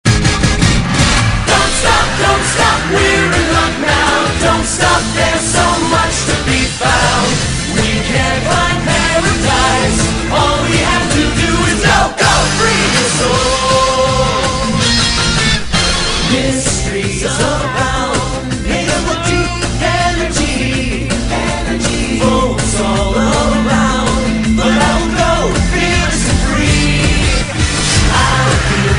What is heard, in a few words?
ringtone